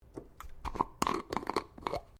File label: Jar Glass Lid Plastic Screw Off